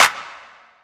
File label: Fury Clap; House; Drum One Shots; Claps; Cymatics